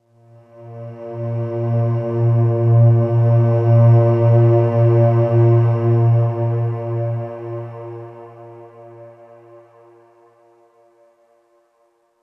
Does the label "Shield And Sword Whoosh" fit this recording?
No